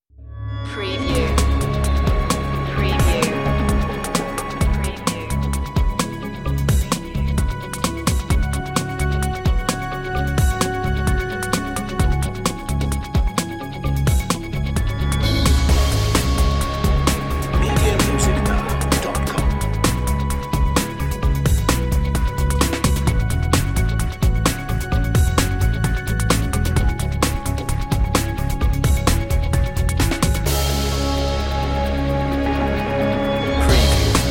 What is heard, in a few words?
underworld series dramatic cue